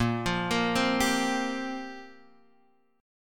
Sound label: C 7th Suspended 4th